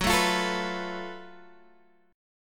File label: F sharp Minor Major 11th, Guitar, Half Step Down